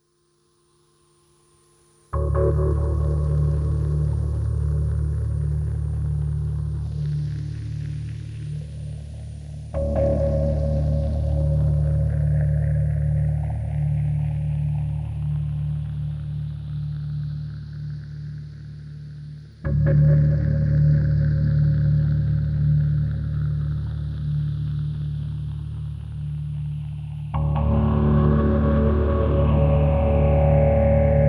Moog Insomniac; Noisebiscuits

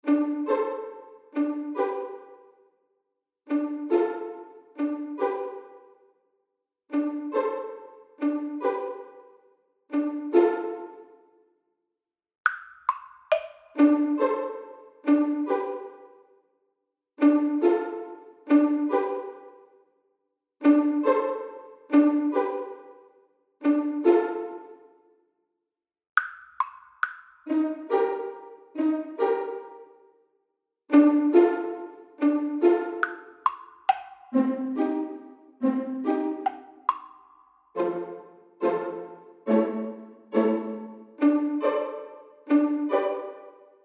Creeping Up; Noisebiscuits

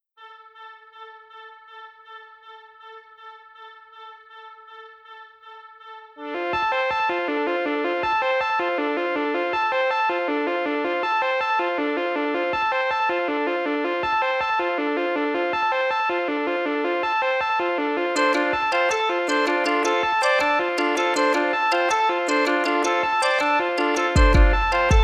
Electronic Activity; Noisebiscuits

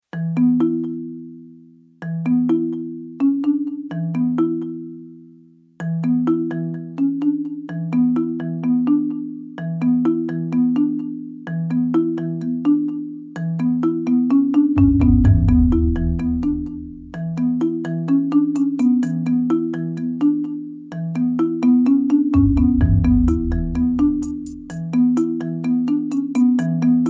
Bubbling Idea; Noisebiscuits

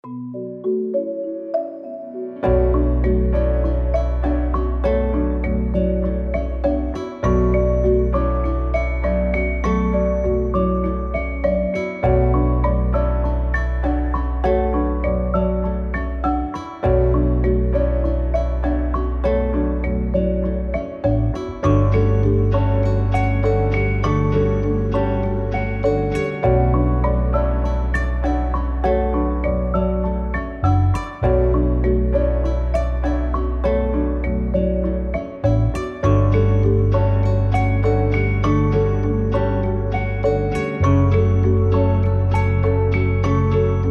Pebbles; Noisebiscuits

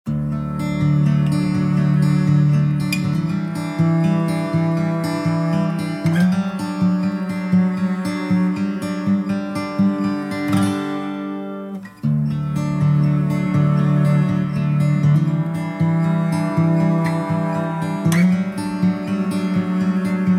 Country Walks; Noisebiscuits

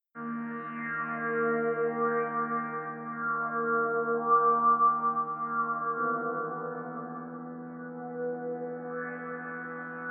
Dodgy Dealing; Noisebiscuits

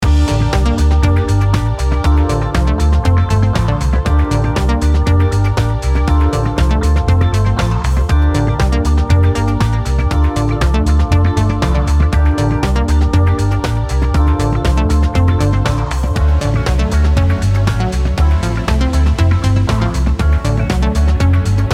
Flyover; Noisebiscuits

